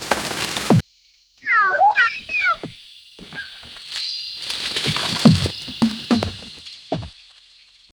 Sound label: cartoon style character, clang